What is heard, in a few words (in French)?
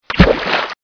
plouf; ChasseAuDragon